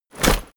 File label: step soldier infantry step